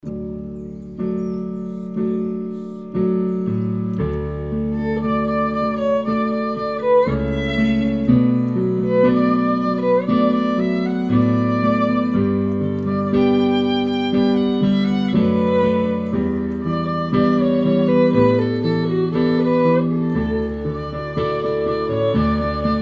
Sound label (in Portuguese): Piano e Cordas